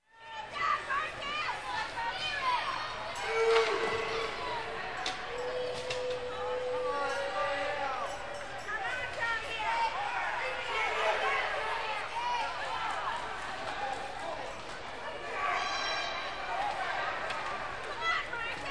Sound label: Parents cheering for their children